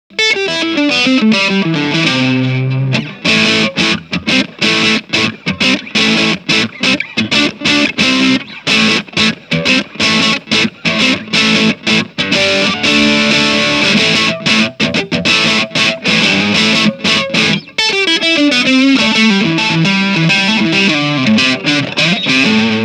Peavey Rockmaster Ultra 50 gain

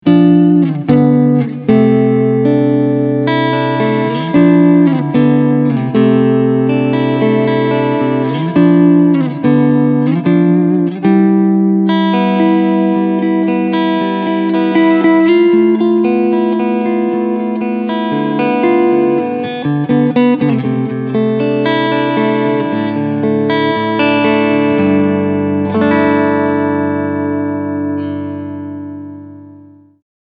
Cleansound Front PU

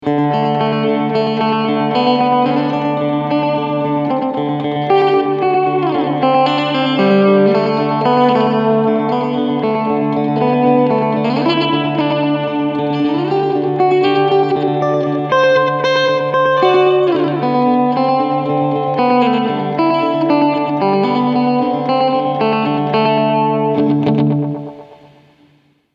Short Delay

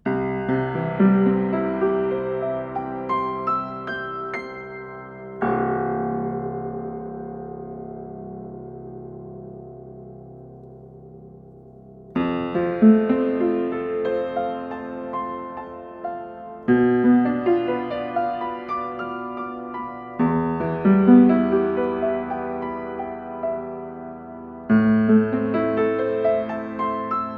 Schoeps CMC6 MK4 Mono Piano

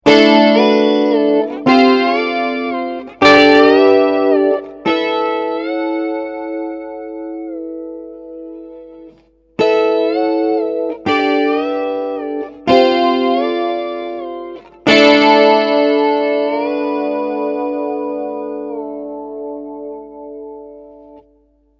3rd Harmony

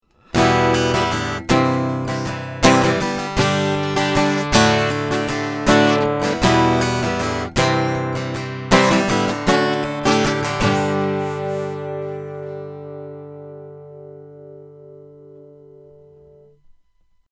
Harley Benton CLD 15CE Flame Koa Exotic Less Bass

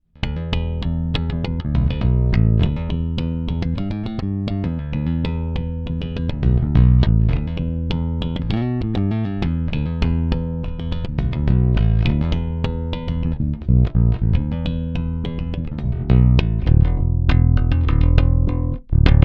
Neck Active Rock

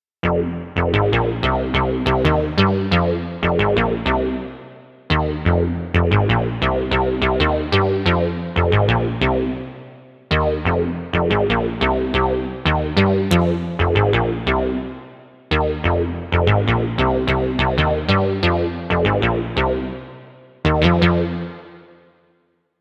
Reso Bass

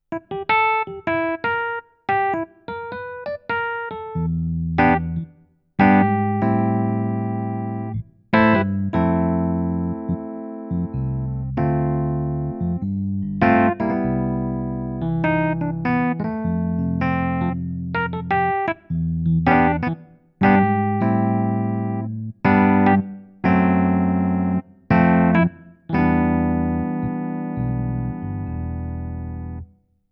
Kawai CA15 EPiano

